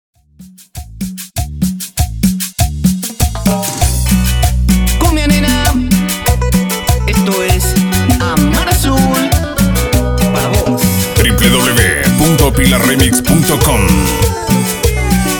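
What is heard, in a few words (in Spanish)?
Extended Cumbia Argentina, Bpm 98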